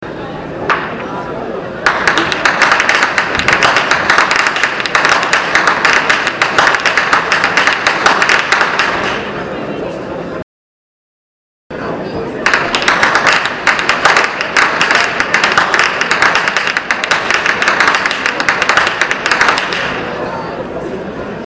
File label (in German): schnalzen, jugend; roth